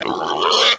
pig idle

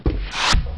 door move; scmod; doors

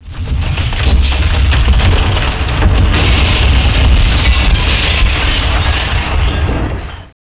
tower implode right

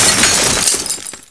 glass

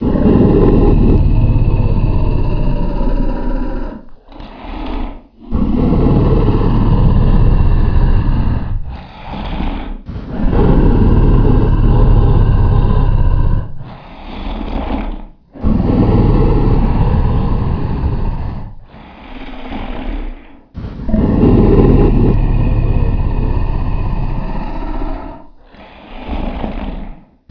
gar breathe

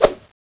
knife shoot, weapons

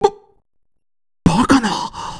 death; vegeta

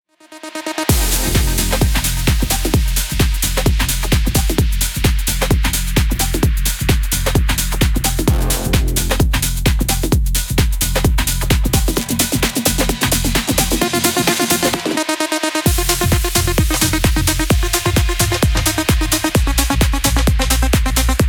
disco house; ragemap2025

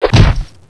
punch

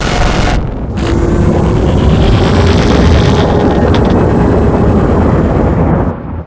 distortion

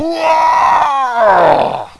attack, chainsaw guy

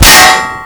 ric metal; DAMAGE

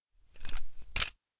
draw admire, grenade; Zshiryu777

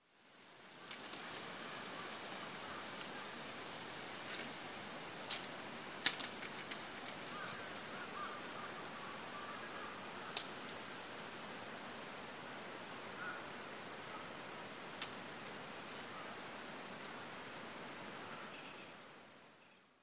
wind blow tree

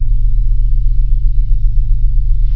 industrial hum

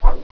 hands miss

